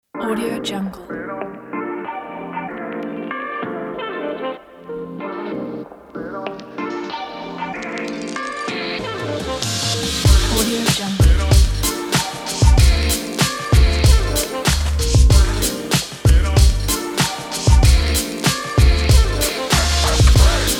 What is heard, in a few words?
the hip hop